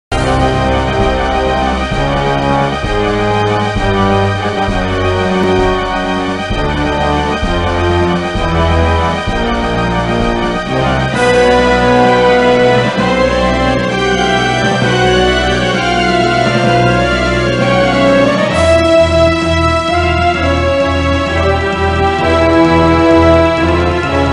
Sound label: Intense Combat Music; wing commander iv the price of freedom ms dos ps1 windows gamerip 1996